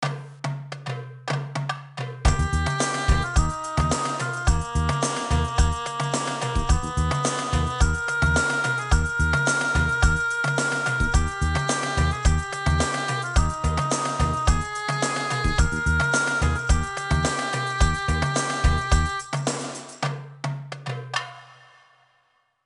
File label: Background Music